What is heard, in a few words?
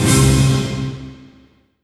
Yamaha TG500 Orchestra Hit